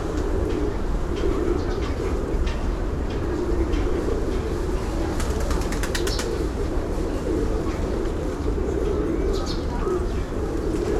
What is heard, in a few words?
Urban park day pigeons people; urban and suburban; boom library